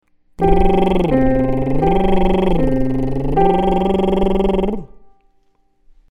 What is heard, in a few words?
Lip Trill